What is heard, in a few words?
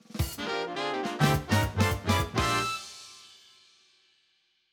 Survival Bowling - Round Start (Jingle); Nintendo Switch Sports